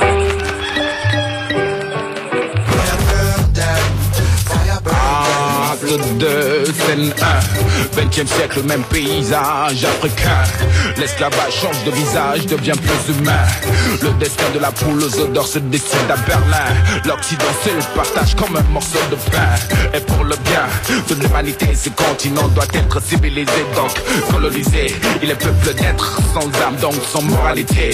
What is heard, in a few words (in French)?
Chanson rap senegalais